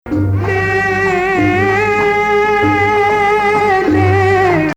2nd Rast, maqam

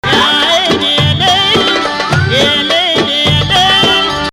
2nd Rast, maqam

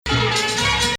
Nahawand, maqam; Rast

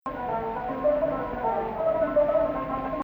2nd Rast, maqam

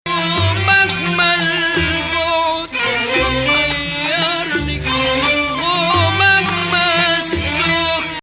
Bayati Nahawand, maqam

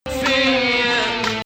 Bayati, maqam

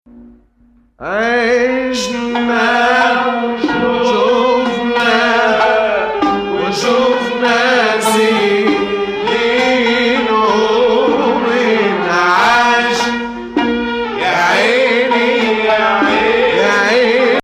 2nd Saba, maqam; Dalansheen Ijma3 Shufna

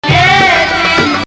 Nahawand, maqam

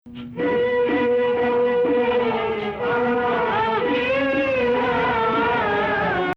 2nd Rast, maqam

